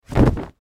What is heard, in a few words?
Cloth Toss Heavy Blanket; Foley; Blastwave